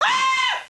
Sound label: zombie scream; zhomer; zombiehomer